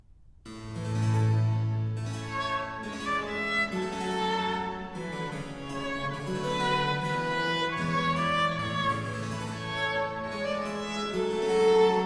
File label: Sonata No. 5 in G minor adagio; Haendel; Sonate a trio